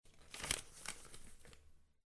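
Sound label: flipped and smoothed a page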